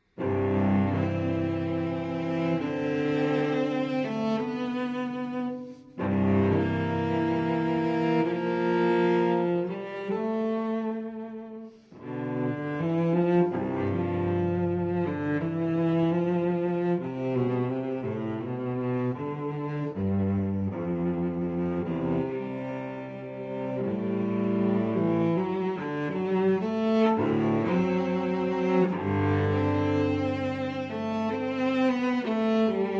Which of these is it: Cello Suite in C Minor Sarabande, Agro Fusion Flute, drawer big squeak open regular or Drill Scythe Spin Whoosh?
Cello Suite in C Minor Sarabande